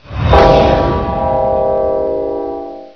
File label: bell tolls; quake2; kitsune